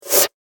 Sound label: Wind Strike Whoosh; Whoosh Sound Effects